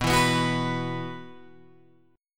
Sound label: B Suspended 4th, Guitar, Open G